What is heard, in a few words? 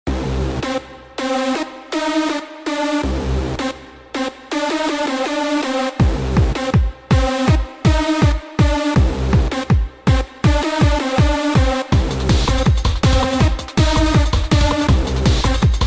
Tension High Tech Racing Soundtrack; htr slot car simulation windows gamerip 2014